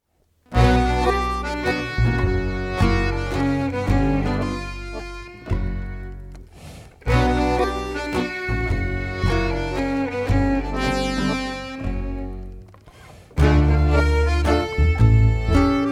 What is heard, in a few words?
mazurka; marie's marie mazurka